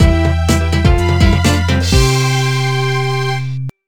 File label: BGM New Series Unlocked; Foto Frenzy Spot the Difference 2009